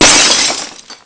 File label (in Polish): szklo